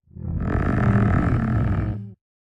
焦躁声; 监守者

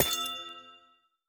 紫水晶簇破坏声

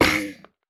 海龟受伤声

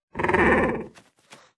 嗅探兽开心声

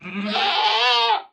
山羊尖叫冲撞前声

